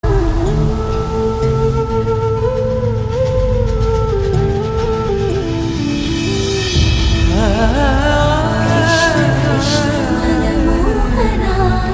Flute BGM, Telugu Ringtone; Prabhas; Radhe Shyam

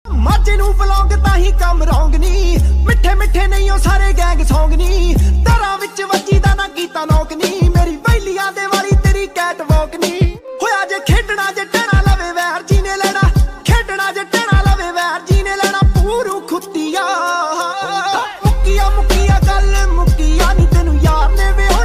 DJ Punjabi Ringtone

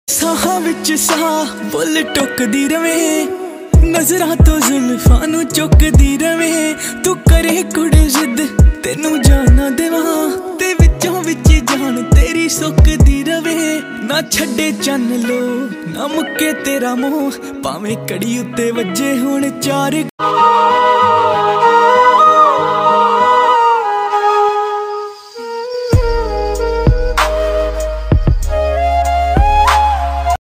Punjabi Ringtone

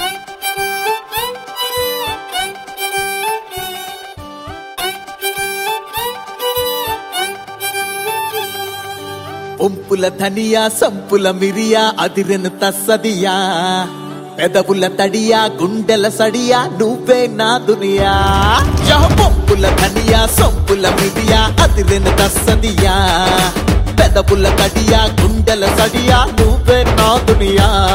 Telugu Ringtone

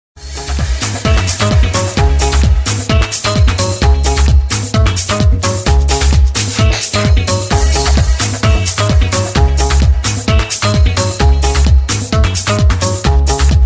Cool House Ringtone

